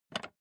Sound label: office chair lever up